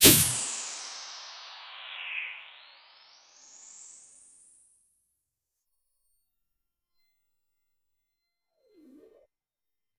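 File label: smooth left to right swipe, motion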